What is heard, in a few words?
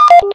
Cam OFF